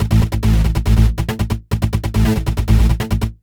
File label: bass; flash tutorial